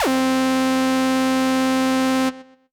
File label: Synth C, Synth Prophet One Shots; Electronisounds